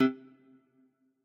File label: menu